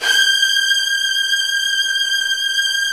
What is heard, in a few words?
Virtuoso Strings